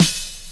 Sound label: SD, Dance Drums